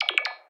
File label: construction robot; factorio 2.0.55